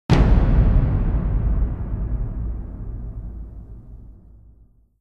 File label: TURN OVER; ArmedAndDangerous; Wrath